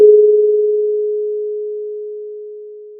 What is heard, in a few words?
Sound of a church bell